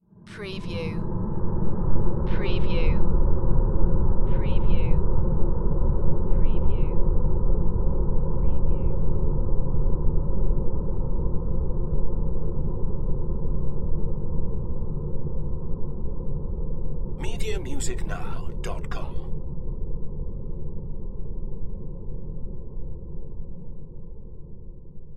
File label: Drone Airy Wind Deep; Sound Effects Bible